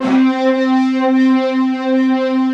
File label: big disto; East West - Global Sounds